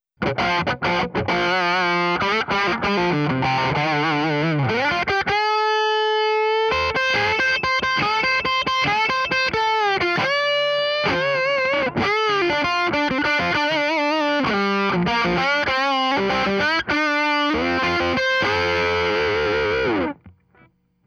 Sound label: Electric Guitar Solo; Daking; Fet II